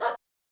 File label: Dog; Yamaha DTXpress-II